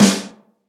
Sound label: 00s long snare drum sample g sharp key, steel snare drum sound tuned to the G#